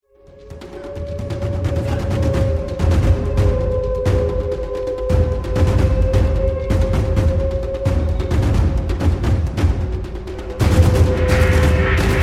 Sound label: Battle, Tension